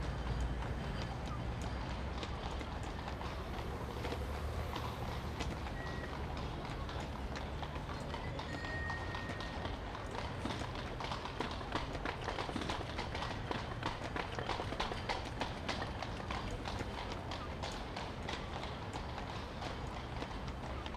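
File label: London exterior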